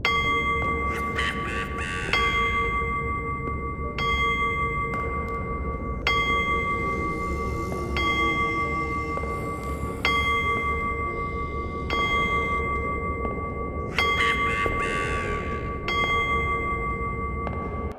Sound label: cuckoo clock